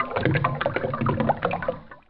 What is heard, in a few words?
item hopper input liquid